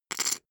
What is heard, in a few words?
poker chip stack small rattle